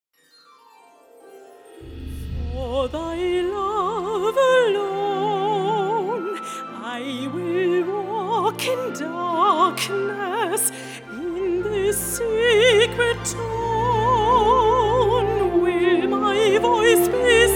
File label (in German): Oper mit Gesang